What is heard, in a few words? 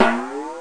bong; boulderdash3d